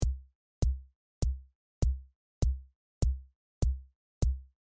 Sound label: hat and kik